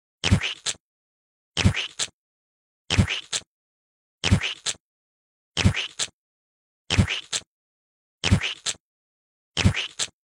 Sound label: Tomato Splat